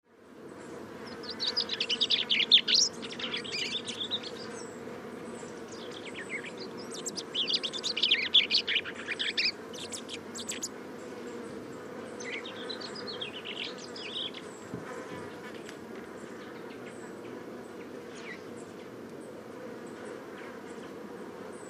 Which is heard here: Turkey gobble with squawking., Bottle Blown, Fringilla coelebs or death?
Fringilla coelebs